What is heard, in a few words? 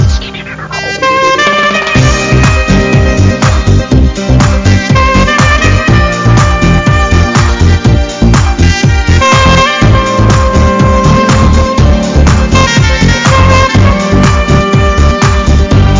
English Music Ringtone